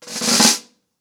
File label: Snare Cres, drums singles; The Roots Drumloops